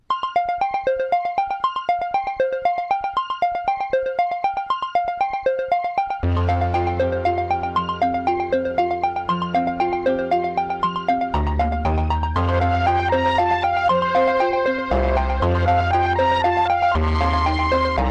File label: FM Towns Startup; FM Towns Marty